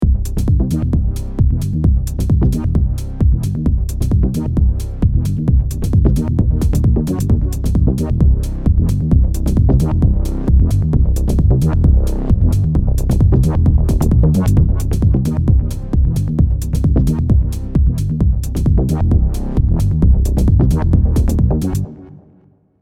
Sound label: LOW Throbber